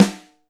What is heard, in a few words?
Snare, Alesis DM5